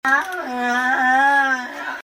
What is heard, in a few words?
yawn